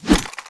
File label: Swish Weapon Swing Swipe; SFX Kit